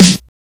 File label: sparkle snare drum sound a sharp key